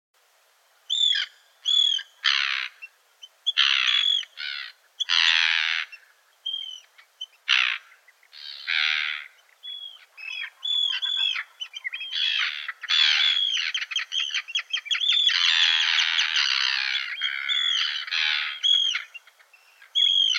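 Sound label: Arctic tern voice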